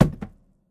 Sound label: phys cube, physics